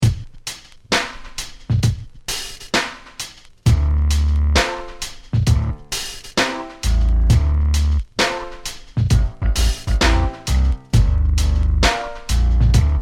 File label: hip hop house, bassoon